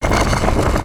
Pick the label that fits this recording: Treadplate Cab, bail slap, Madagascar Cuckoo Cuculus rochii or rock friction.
rock friction